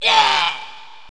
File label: drown; alienarena; femforcer